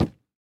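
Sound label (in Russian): короткий стук в деревянную дверь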